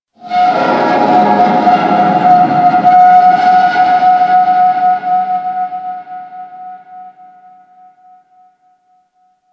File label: Infested Distant Metal Groan, Ambience; Warframe; Corpus Infested